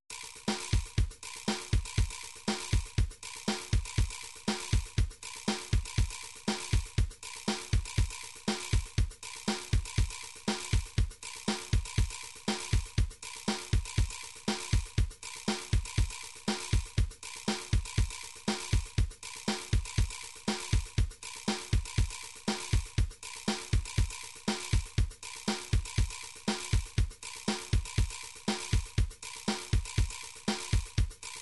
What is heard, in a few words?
drums, midi drums